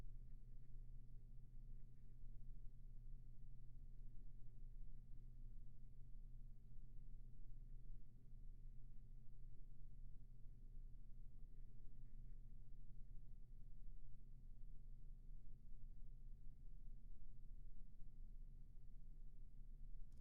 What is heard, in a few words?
ROOMTONE